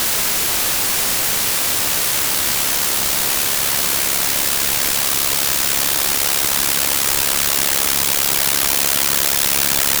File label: modulated noise varying depth